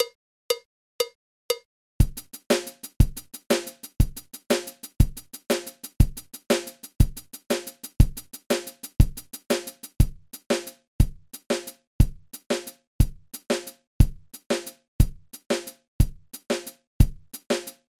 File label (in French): rythme triolet frise